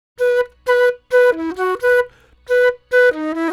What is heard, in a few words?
067 Chill Pop Flute B; Pop Rock